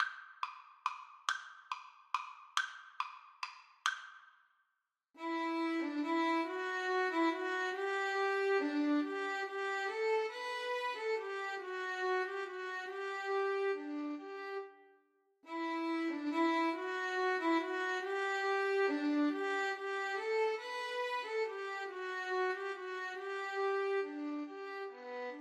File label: siciliana violin duet